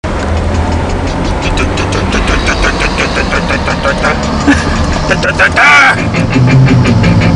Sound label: singing